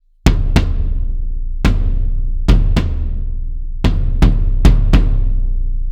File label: marching bass drum; pitchshifter PSI